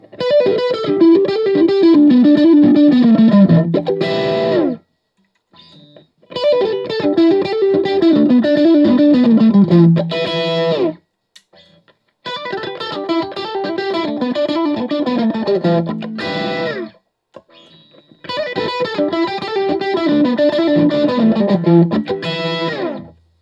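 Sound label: Guild 1975 M80 JCM800 Solo, guitar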